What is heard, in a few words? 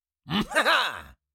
Cartoon little monster voice jump